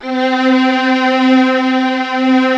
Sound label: LARG STR C3, KORG 05; USB Soundscan vol.49; Analog Fantasies; AKAI